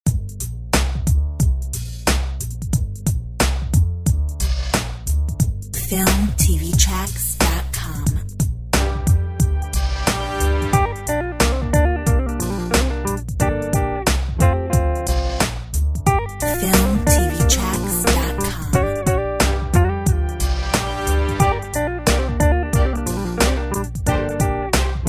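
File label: R&B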